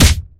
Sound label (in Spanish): pelea